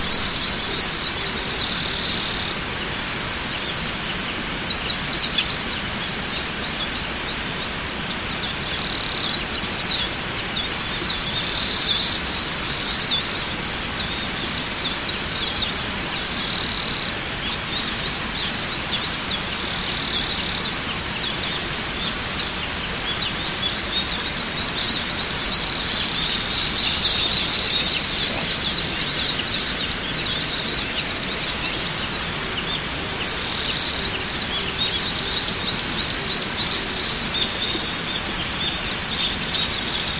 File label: water bird